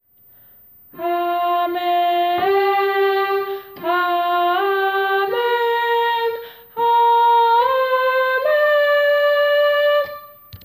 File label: Amen